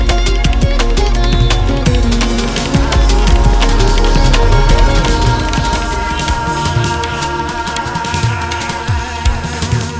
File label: high crossfade